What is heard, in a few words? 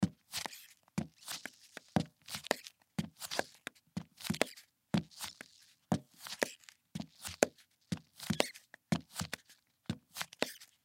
Footsteps Flip Flops Wet Wood Surface Walk Squeak; Foley